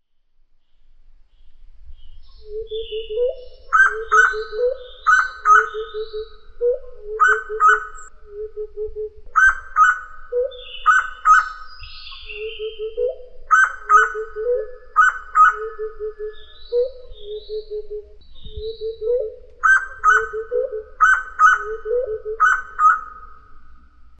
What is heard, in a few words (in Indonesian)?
suara poksai botak duet